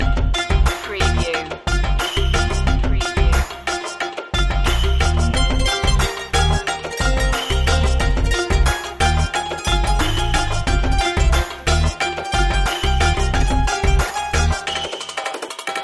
the magic sitar loop

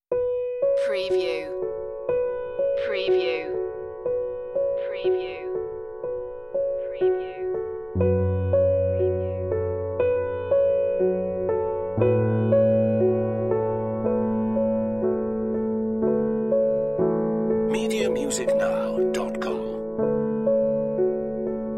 piano only

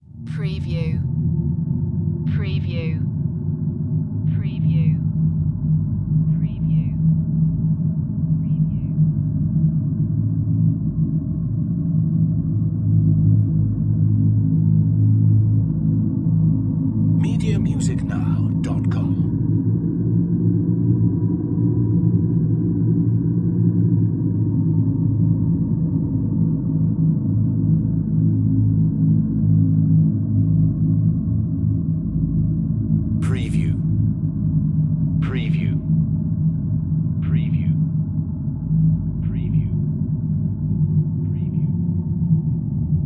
Drone Moans; Sound Effects Bible

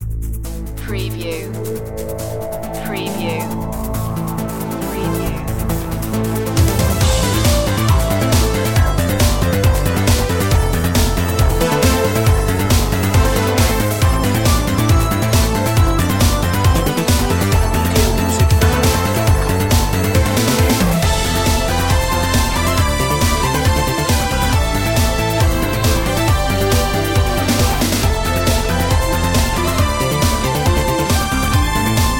retro techno assault

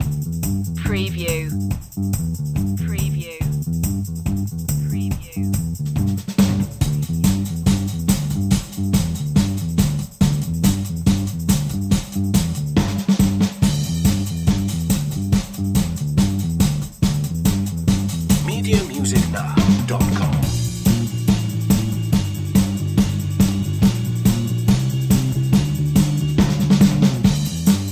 bass and drums

